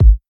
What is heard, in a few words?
clean kick drum one shot g sharp key